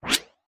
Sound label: Interface Swipe